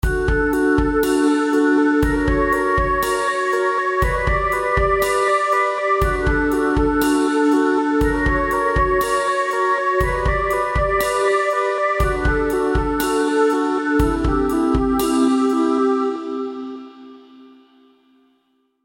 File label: really nice chillout